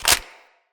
Heavy Weapon Clip In, Reload; Weapons

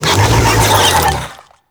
Infested Big Ashen Vocal Attack; Warframe

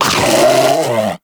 Predator Vocal Alerted; Warframe; Hunting Critters; Characters

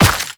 Run Dirt, Footsteps; Warframe; The New War; Characters; Kahl

